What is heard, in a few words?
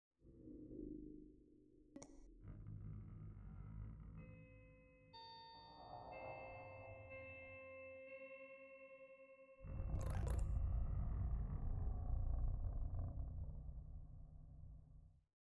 Guitar Piece